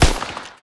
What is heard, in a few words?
Deagle